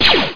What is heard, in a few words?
pistol